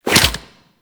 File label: Infested Tendril Step, movement